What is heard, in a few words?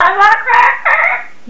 polly cracker; pirates